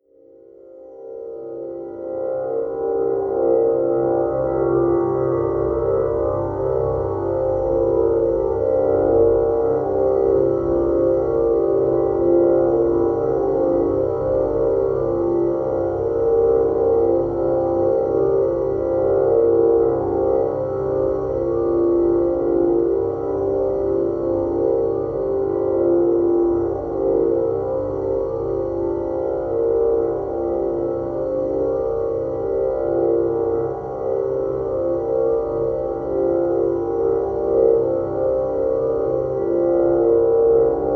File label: drone scratchy droner